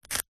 office brick pen ball point score mark

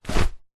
sport wetsuit drop ground; zapsplat

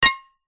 impacts glass clink hit no break; zapsplat

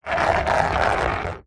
foley plastic tub lid scrape short concrete; zapsplat

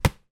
sport afl australia football bounce ground

